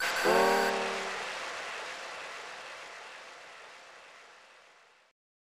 Goal

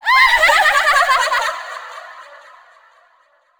laugh; yami

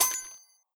Coins; Idle Champions of the Forgotten Realms

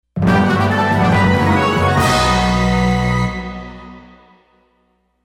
Quest Success; one piece unlimited world red

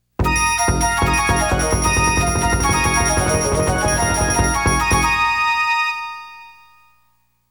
Game Success; tokimeki memorial forever with you

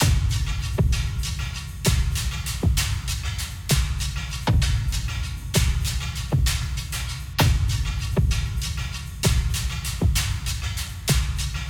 Mus Action Perc Low 130; moons of madness

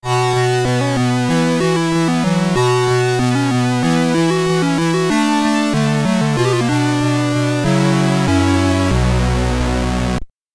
Game Over (SID Stereo), commodore 64

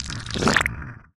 Leviathan Spawn

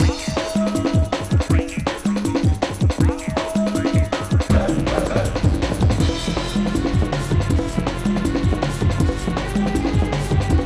Mysterious Jungle (Bees); madagascar; ps2

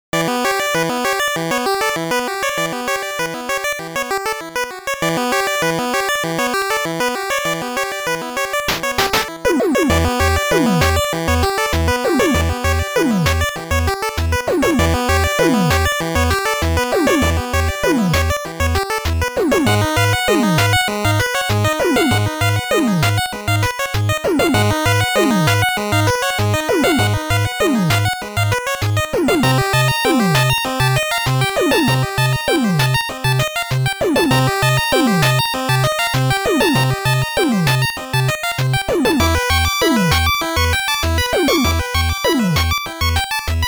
Suspense; Mega Man 9; NES remake

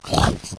Monster Hurt; A Robot Named Fight

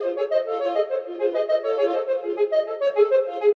loop 102bpm; theme confrontation part03

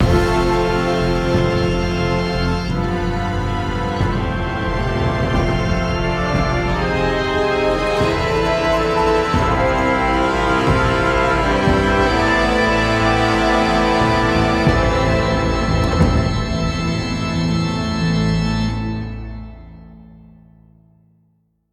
Action Swell; batman the enemy within

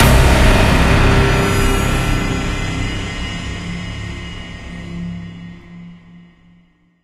After Crash Action End; Scourge Outbreak

